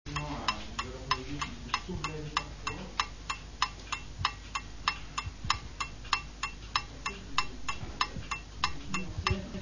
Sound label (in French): temp tic tac